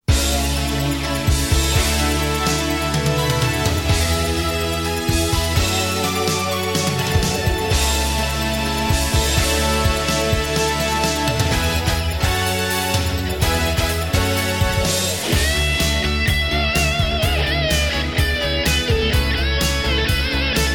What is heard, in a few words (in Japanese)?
(karaoke); Idol Bouei Tai Hummingbird Sisters